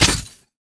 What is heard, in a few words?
chain